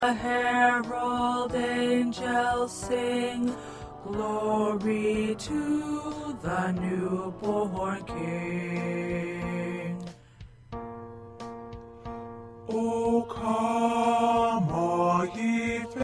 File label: Low